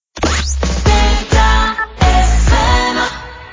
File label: jingle; deltafm